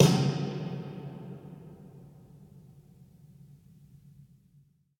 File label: snare factory